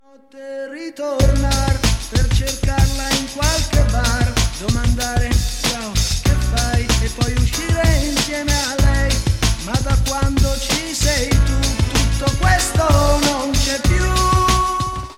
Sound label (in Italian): remix